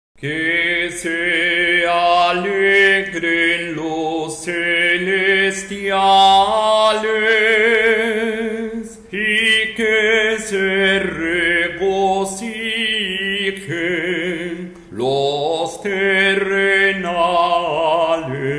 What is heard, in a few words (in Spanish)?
Tropario de resurreccion tono 3 canto bizantino; iglesia ortodoxa; ecuador